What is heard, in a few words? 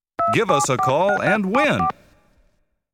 Give Us A Call And Win, jingles